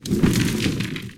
ability stone prison down

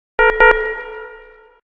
alarm

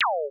sfx laser

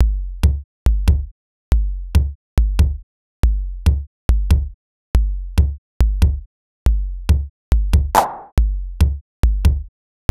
drumbeat start

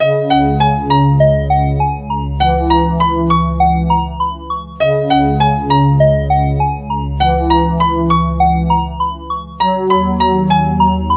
Menu Song, Calm and simple title music

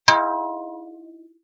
bell ding

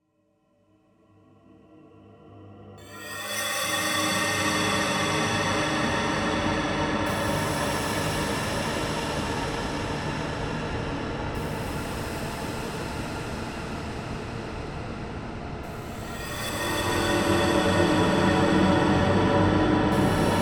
abandoned building ambience

